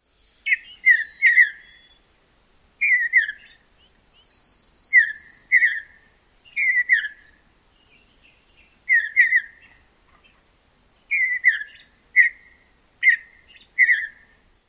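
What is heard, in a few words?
song, Mountain Bluebird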